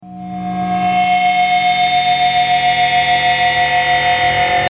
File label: Guitar feedback fx